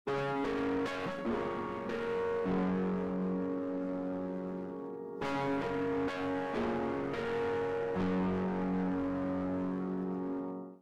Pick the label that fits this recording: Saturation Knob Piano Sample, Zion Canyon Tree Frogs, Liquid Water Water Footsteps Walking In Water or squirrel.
Saturation Knob Piano Sample